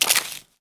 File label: ice footstep; metrocraft